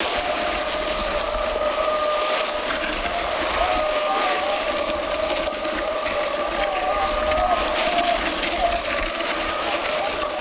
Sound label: SKID EARTH; SIMUZENSOUND